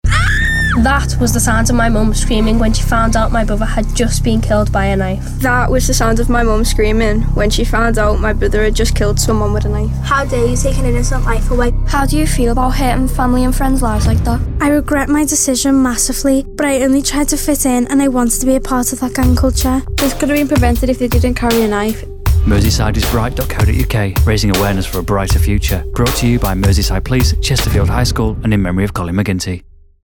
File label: scream